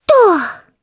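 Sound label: Vox Landing, Japanese; Requina; kingsraid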